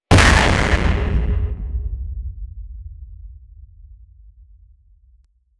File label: a sonic boom of breaking, aviation